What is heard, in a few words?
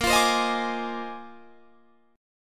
Bb 11th, Guitar, Drop D